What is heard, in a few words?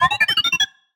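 Sci-Fi Droid Robot Emote Beeps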